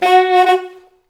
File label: SAX A. STAB, Alto Section; Roland L-CD702